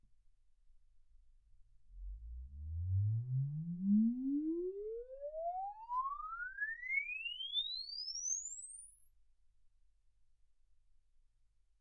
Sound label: exp swept sinus 10Hz 24kHz